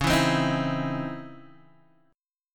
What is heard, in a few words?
C sharp Minor Major 9th C sharp Guitar Open D, chord; 11,7,10,9,7,x